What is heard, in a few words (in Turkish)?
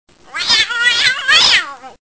kedi sinir, hayvanlar